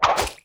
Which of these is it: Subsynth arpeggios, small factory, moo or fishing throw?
fishing throw